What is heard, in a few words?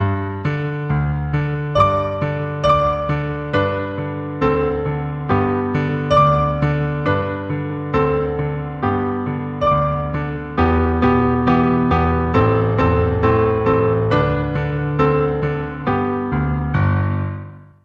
hot cross buns ringtone